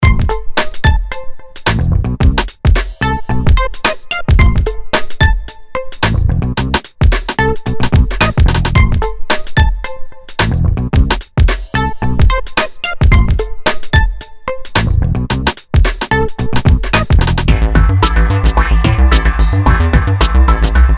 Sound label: urban beat synth